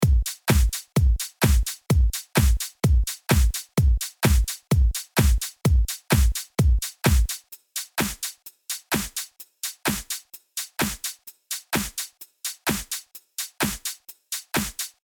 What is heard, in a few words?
electro house